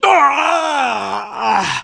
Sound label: death